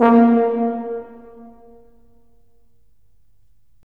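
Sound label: brass tuba; LA Composer vol. 3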